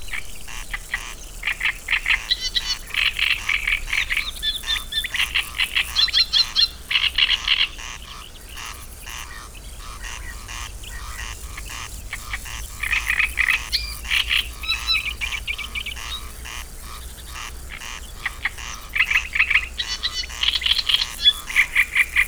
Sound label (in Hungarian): halk háris hangos nádirigó, lápály; teresztenye